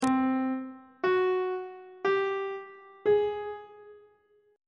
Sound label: c 5th interval pitches on treble clef